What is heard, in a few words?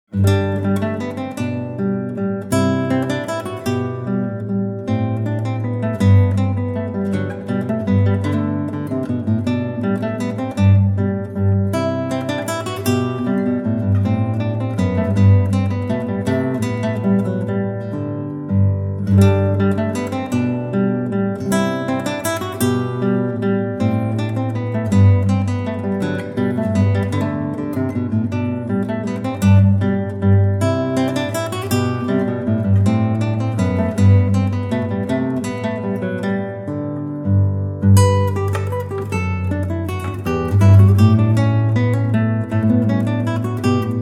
Minuet In G Major